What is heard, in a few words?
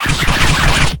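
Attack Elf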